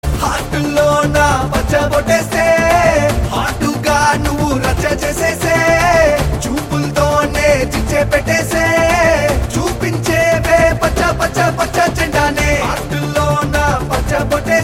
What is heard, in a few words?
Ringtone